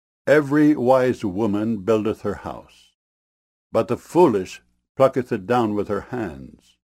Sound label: plucketh